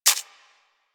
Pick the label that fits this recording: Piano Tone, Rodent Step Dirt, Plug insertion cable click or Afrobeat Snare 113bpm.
Rodent Step Dirt